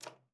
D4, alto sax; Xsample VOL-2

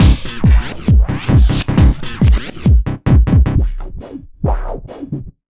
Big Beat Drumloops, LOW 135; AKAI

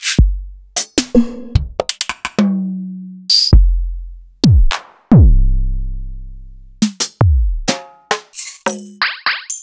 EMU Orbit

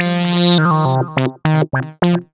lead (brass); Sonic Foundry (Sony Creative Software); Ugly Remnants VOL-2; Leads

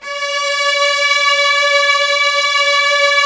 STR LUSH S, MIDI Section; String Master Series; Combos 2

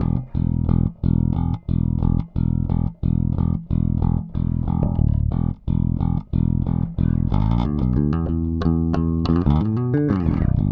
Rock, Bass; Bass X

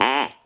Duck call, cartoon Foley Fx

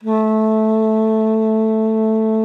A3, alto sax; Xsample VOL-2

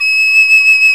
piccolo

